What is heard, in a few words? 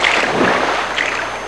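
water splash; GAMECUBE; SUPER MARIO SUNSHINE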